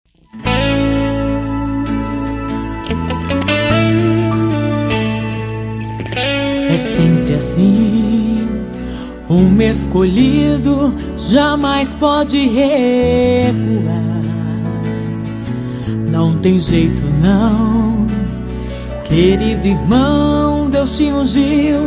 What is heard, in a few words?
gospel